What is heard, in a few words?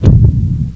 barred door down